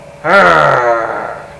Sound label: grunt; gemiti esclamazioni mugolii